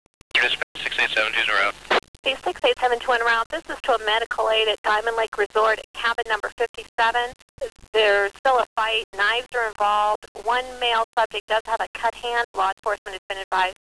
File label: knive